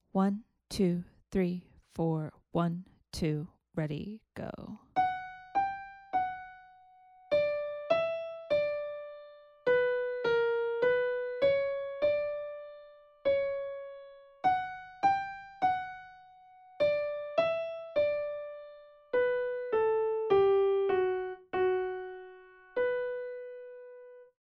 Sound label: melodic dictation